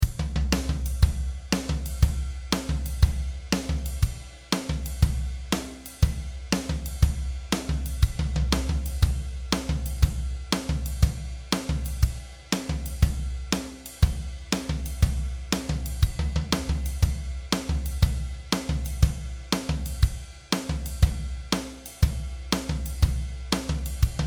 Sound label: jazz